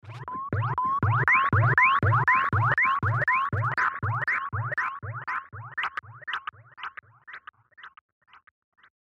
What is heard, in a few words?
Random Alien Ambience; science fiction sound effect; Andrew Fleming